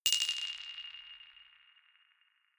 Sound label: notification glockenspiel rattle single high